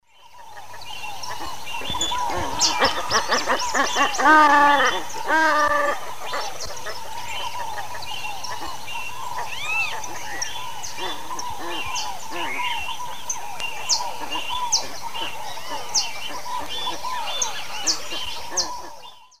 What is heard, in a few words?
Neochen jubata, flight calls; S. America; Eastern Lowlands